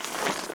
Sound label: quest folder close halloween